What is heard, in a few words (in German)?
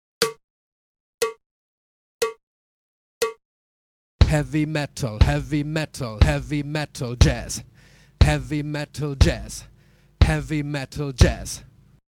Uebung, schlagzeug